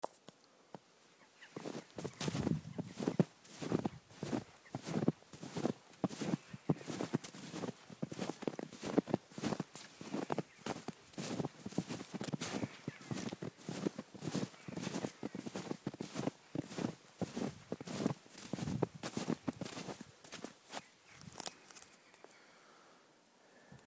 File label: Walking In Snow